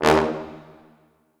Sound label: Orchestral Brass